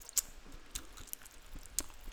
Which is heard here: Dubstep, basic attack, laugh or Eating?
Eating